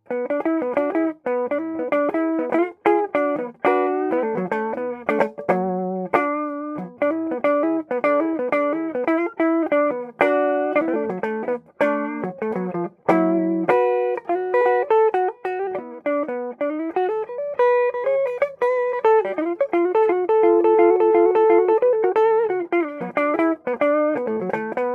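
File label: es335 clean, guitar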